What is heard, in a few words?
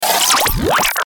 Stinger Power Up; Stinger Power Up Warble; Stingers